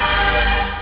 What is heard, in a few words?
hit, synth chords